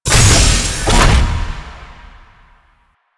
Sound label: Taller Long Door Open; Warframe; Ambience; Entrati